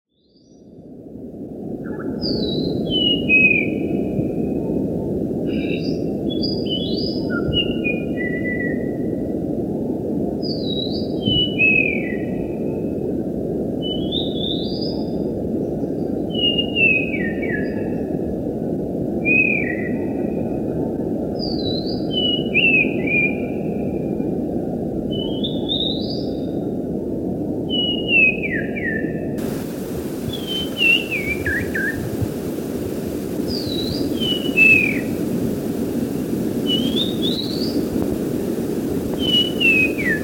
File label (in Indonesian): suara burung kacer masteran seychelles